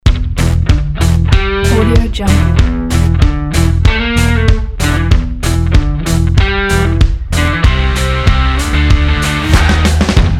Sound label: upbeat energetic stylish rock